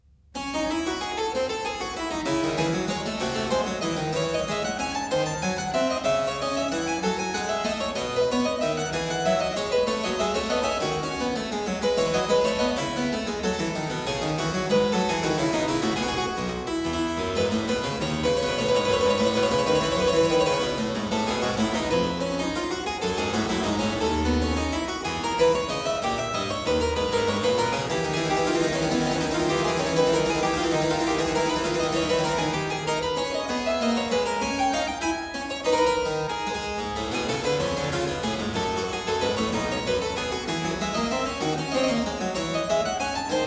Zweistimmige Inventionen in D minor, Keyboard Works; BWV 775; J.S. Bach; Inventionen & Sinfonias